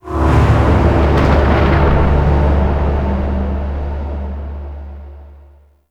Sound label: Mood Change Stinger; Warframe; Duviri